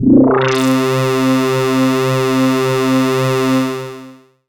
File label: syn c, Dance Synths; ProSamples vol.37; Juno Jam; Best Service